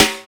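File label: Vintage Snare, Roland BK-5; Snares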